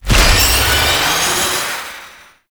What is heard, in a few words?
Siphon Cast; Warframe; Infested Lich